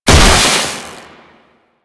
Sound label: Purification Weakpoint Destroy; Warframe; Nineteeen Ninety Nine; Infested Survival